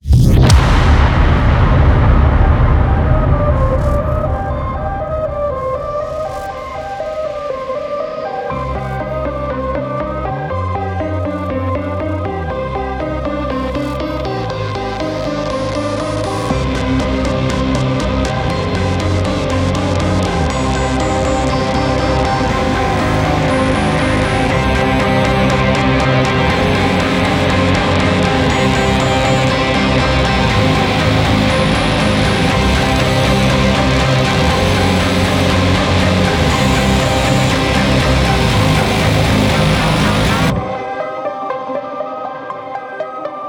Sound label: PVP Lose